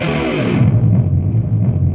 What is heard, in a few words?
WHAMMY DIVE, HEAVY METAL; Greytsounds